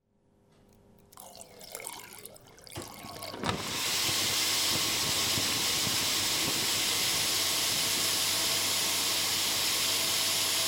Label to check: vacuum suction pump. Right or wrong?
Right